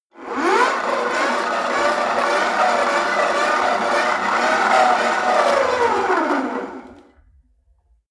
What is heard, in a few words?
rope trainer pull sequence fast long; sport freizeit; fitnessstudio